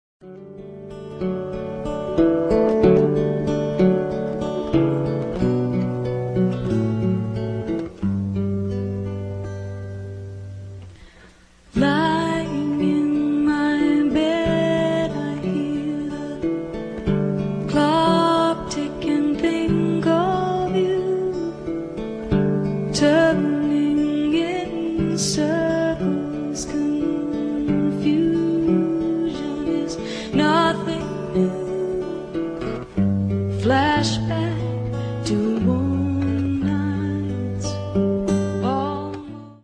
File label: acoustic